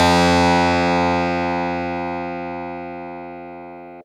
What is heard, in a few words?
Fuzz Clav DX100 F2